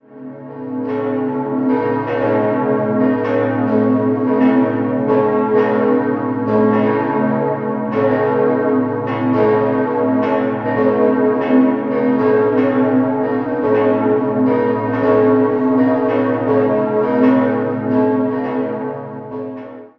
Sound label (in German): Zwickau Dom, glocken